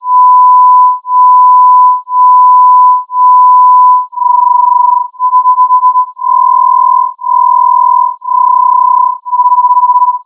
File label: Sound Sample Throb